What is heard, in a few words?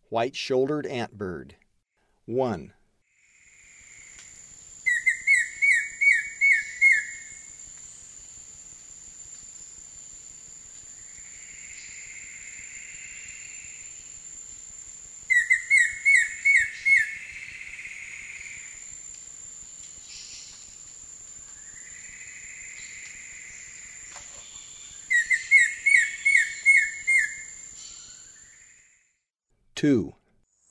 White-shouldered Antbird; S. America; Eastern Lowlands